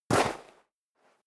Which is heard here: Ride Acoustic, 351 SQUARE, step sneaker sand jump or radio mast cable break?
step sneaker sand jump